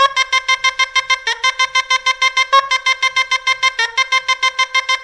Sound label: World Lick